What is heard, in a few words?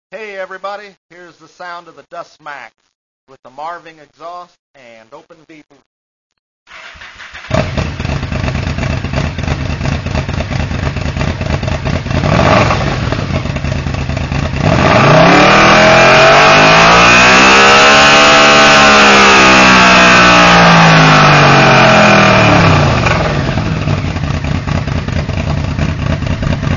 start big rev